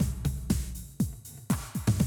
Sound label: Classic Drum Loops